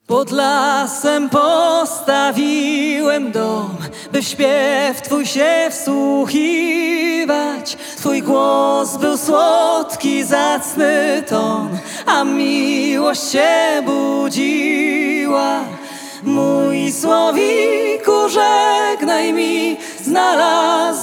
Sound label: Słowik Nightingale